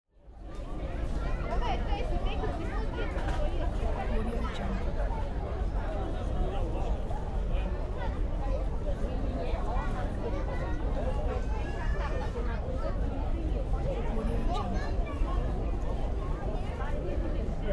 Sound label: krakow tourists crowd near the wawel castle; urban sounds